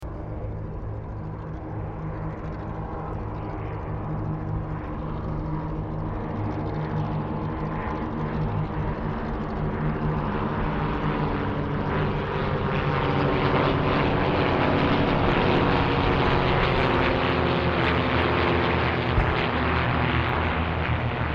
aircraft 1975 ct4a squadron pass stereo

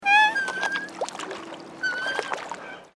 bird dusky moorhen call

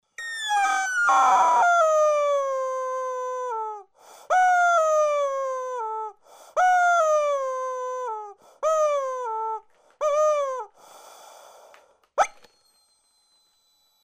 toy chicken screaming

